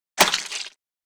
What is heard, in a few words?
object being squash; fights bodyfalls; Asian Show Sound Effects